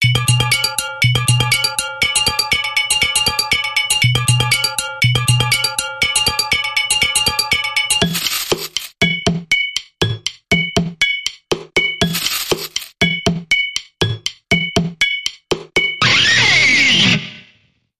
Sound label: Percussion Bumper Reversed